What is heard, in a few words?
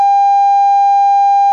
alert tone